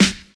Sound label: Present Snare Drum G Key, snare one shot tuned to the G note; snare drum samples